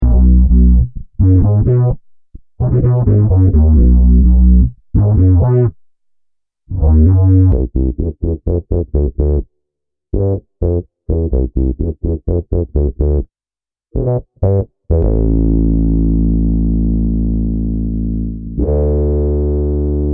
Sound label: Experimental Live Bass Loops